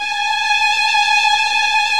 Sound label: VIO MIN TRIL